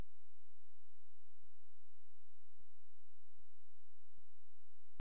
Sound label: yard